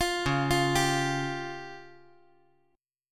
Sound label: C sharp Major Flat 5th; arpeggiated; chordshape; 10,13,13,10; JGuitar